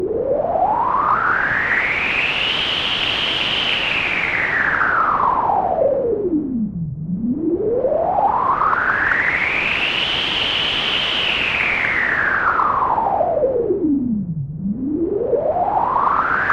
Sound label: A118 white Noise through A124 Wasp sweep; CGS